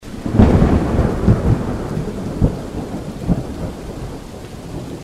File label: thunder; de paranoid